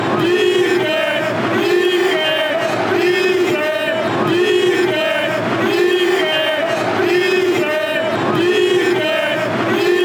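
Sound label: chant spain, football songs and soccer chants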